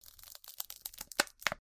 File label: petbottle hold